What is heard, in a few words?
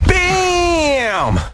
bam; garrysmod